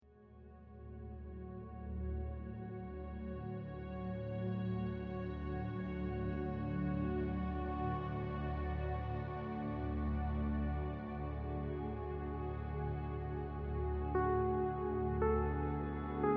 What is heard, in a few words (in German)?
Herzmeditation, Heilmusik